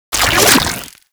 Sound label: Rifle Charged Grapple; Warframe; Infested; Quanta